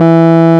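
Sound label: RMI piano E 2, EP; Sonic Reality - Vintage Timetraveler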